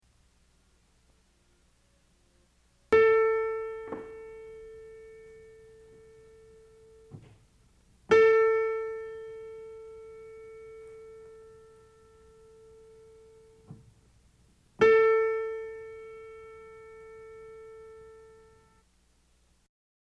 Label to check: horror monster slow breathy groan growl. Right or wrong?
Wrong